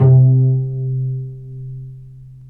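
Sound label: strings cello pizzicato, dry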